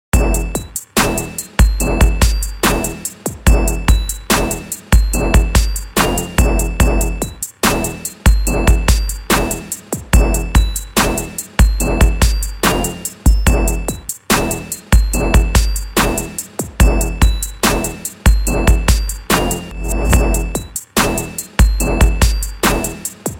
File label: loop beats; full tilt remix vol 011